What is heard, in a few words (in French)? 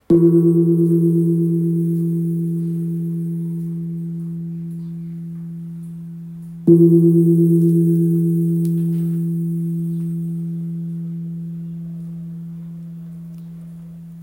Gong birman Mi 2.9kg